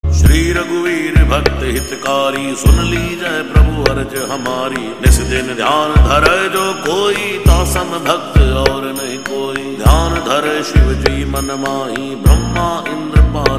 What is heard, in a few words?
Lofi